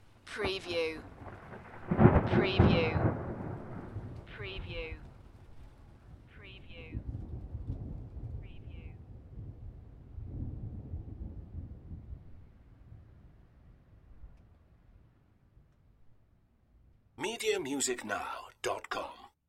Thunder Medium Thunder Clap with Long Rumble Tail

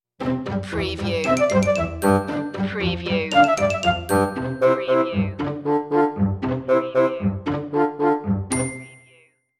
market square stinger

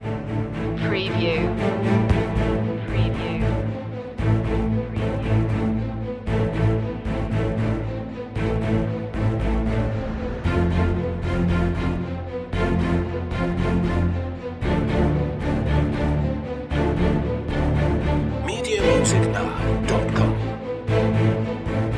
orchestral mix low intensity

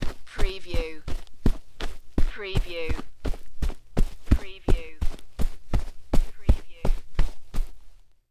Footsteps Run On Dirt

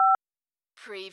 dialtone; Button / Multimedia Sounds

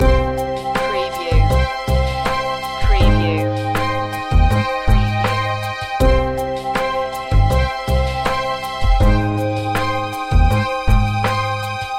quiet love loop

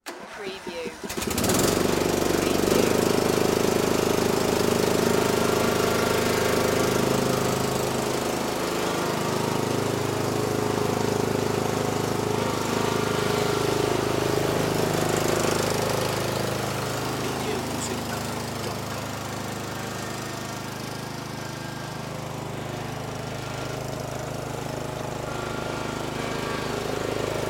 Riding Lawn Mower

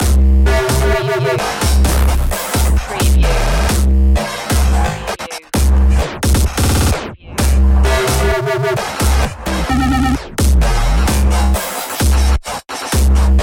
destroyed loop

